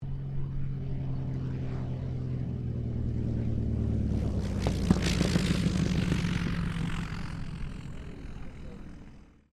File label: AIRCRAFT CESSNA 195 LAND; Airplanes; Vehicles; Aircraft Collection; Twistedtracks